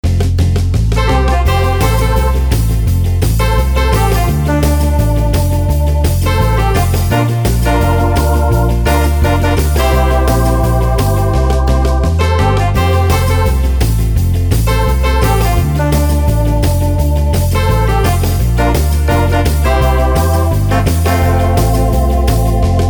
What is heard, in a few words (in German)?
E-Gitarre Drawbars Twist; Wersi